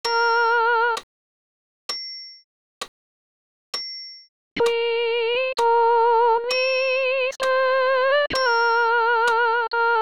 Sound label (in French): Gloria sop voc seul